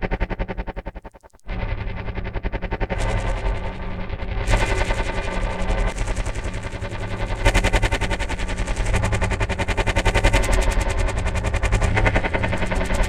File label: Springy, Ambient; Zero-G Seismic Frequencies; 90 s Sample CDs